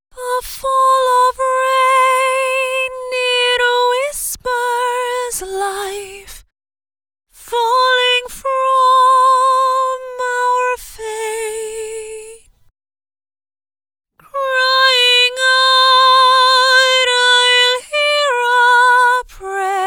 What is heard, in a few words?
Top Line Vocal - Am 70Bpm; Top Line Vocal 2; Construction Kits; VOCALS; Ghosthack; SkyRealm